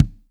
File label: BRASH KICK, JAZZ